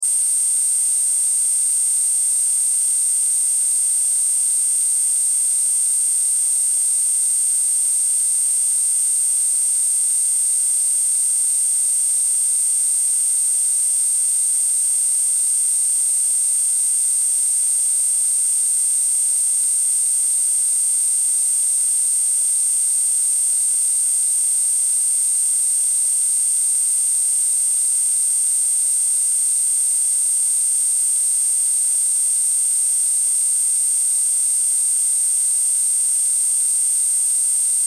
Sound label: Sci Fi Buzz Hum Ballast Electrical Loop; High Pitch; Tech